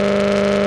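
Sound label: engine exhale, advanced engine sounds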